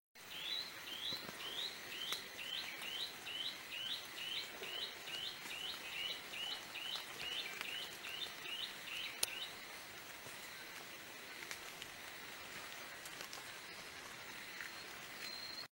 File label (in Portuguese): canto do choquinha de garganta clara